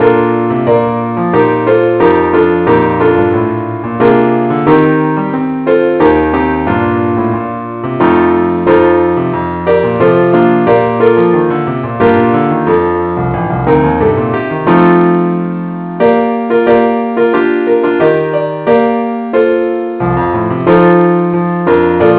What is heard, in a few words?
keyboard only key Bes; i need thee